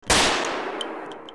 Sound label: bullet sound